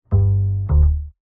Bass, Jazz for piano

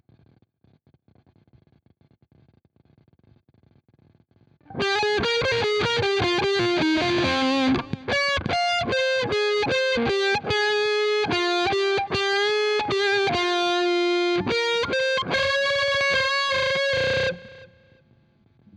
Guitar

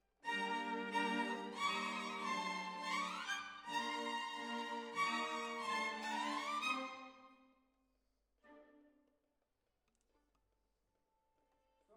Violins, jazz for piano

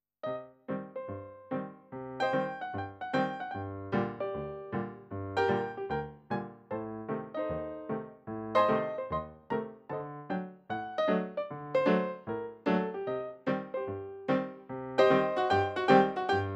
piano, Ragtime

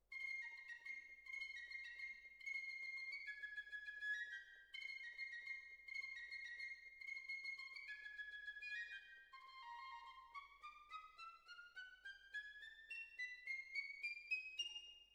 Violin Solo

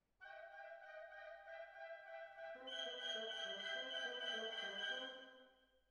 Cbasses

